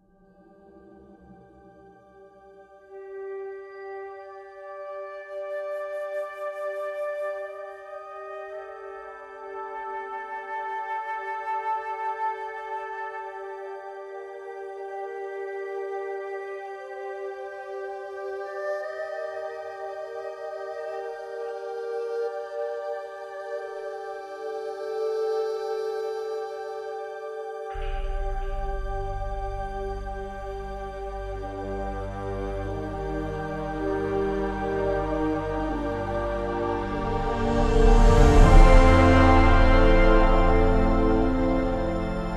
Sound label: Menu Standard